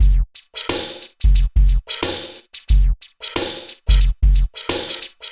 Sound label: Synth Loop, BPM 90